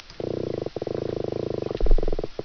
Aplodinotus grunniens, Tivoli Bay on the Hudson River; soniferous